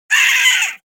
yell

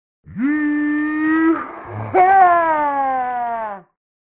yeehaw

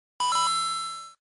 coin; cave

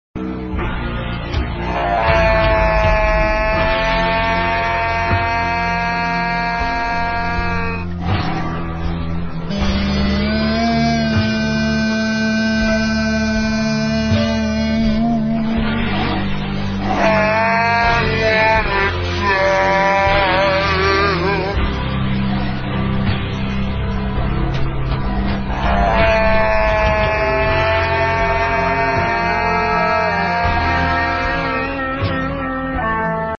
aah

